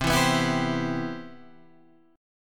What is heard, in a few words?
C Major 7th C down